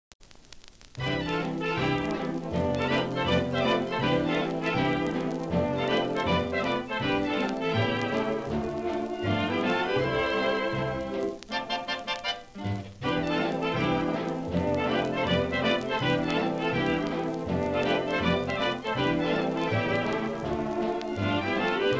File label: Orchestra; Popular Jazz Archive 1920-1936